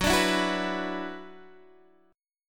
F sharp 7th Suspended 4th Sharp 5th, Guitar, Open D